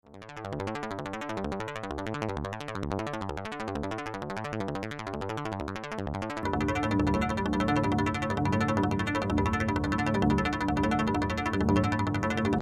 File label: string filter